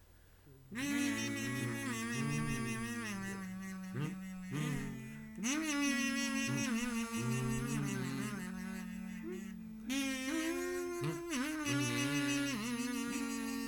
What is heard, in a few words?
kazoo